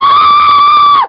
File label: scream